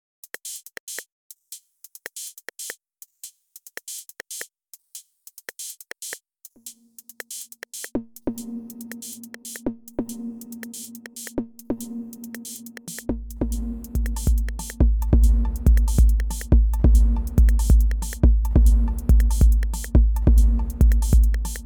reverb

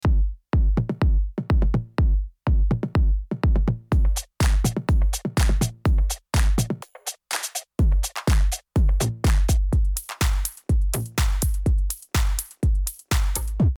DRUMS DISTORTION; API

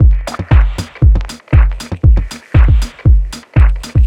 Group Processing

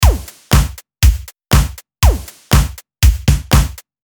Nu Disco Cosmic Disco

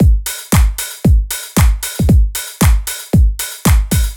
bd house

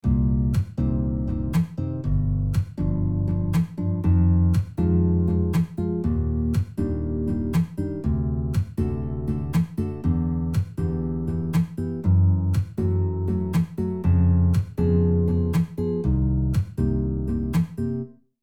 correctly voiced guitar chords

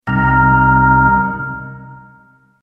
chord

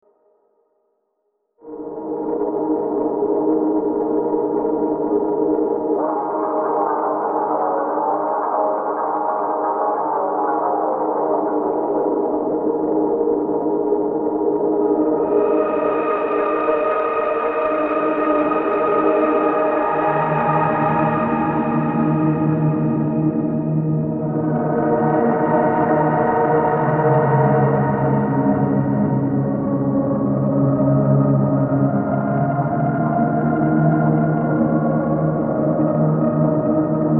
Pad Shop

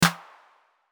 Layered clap snare old school Initial layering

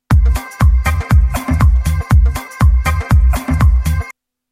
unprocessed volca loop

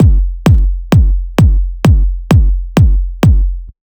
Kick Chain

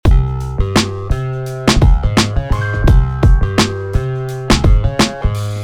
Boom Bap; TUTORIAL; with Toontrack

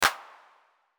Layered clap snare old school clap

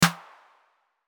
Layered clap snare old school edited clap

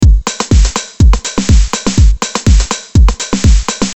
Full Beat

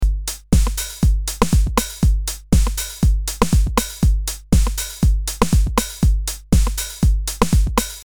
Instant Haus MIDI effect into Core 909

